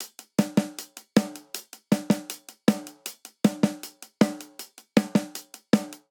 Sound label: Twist Snare & Hihat 157 bpm; 4 4; MIDI Clips; Ableton; Factory Packs; Drum Booth